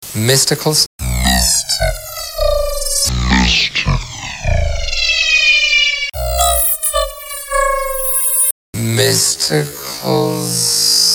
granular

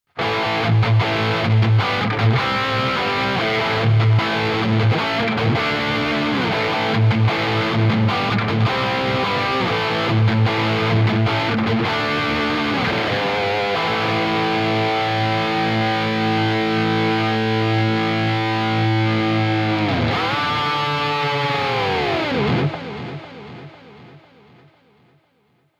Main Dist

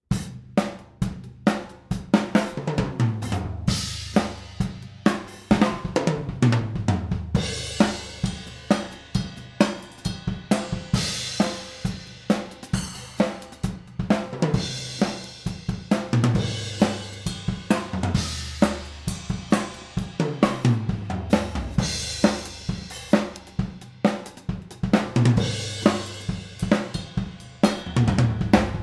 oh sennheiser mkh 40

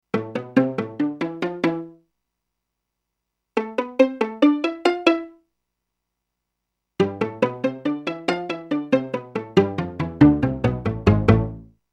Pizzicato

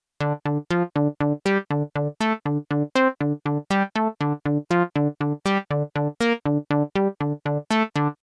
Sem-V ARP

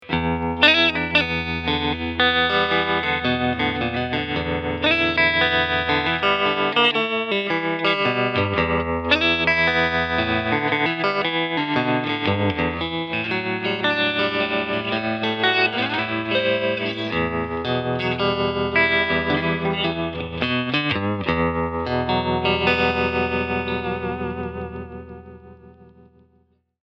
Fender 62 Princeton Tone FULL Vibrato

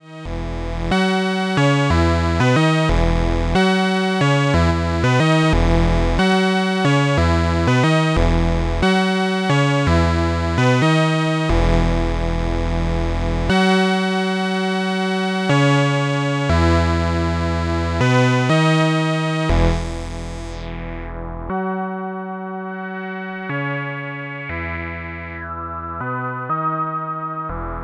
Behringer 2600 blue 3 VCOs wet

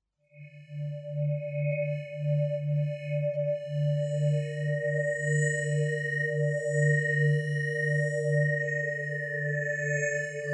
Metals

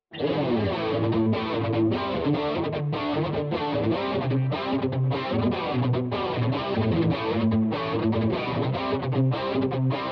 DUAL CUBE Metal Stack w Chorus Rev

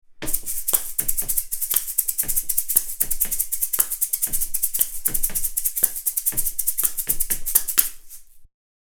GA-800G Beat

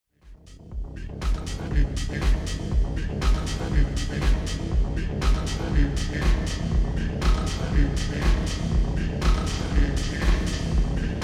DLY Reverb; Xone PX5

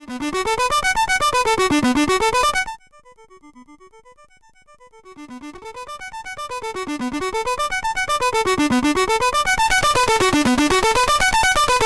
vcf1 BPF Feedback

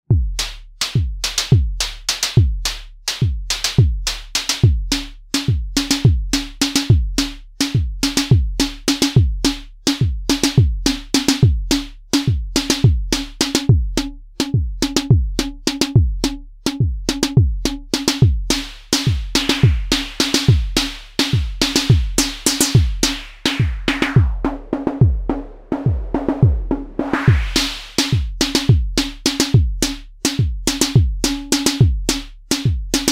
Snare

